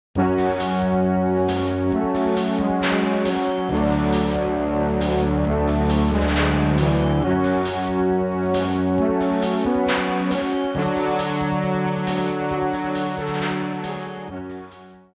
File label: Level Complete (Mechanical); Shipwreckers; PS1